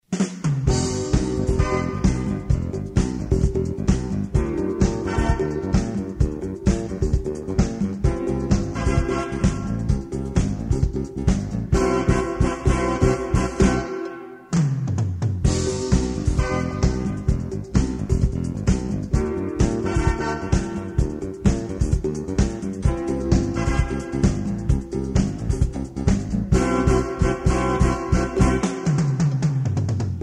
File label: salsa